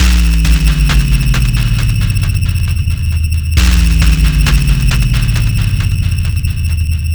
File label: MORPHINE MACHINE, STRANGE MACHINE LOOPS